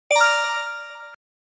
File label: achievement